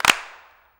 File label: CLAPS, SINGLE CLAPS; Best Service; World Colours